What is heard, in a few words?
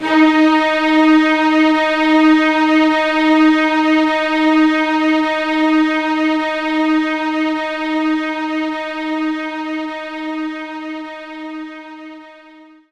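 String Loop D sharp 3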